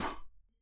shoot, weapons

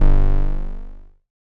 Allert Computer; FREE SFX PACK VOL 2

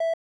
beep, 8 bit sound effects

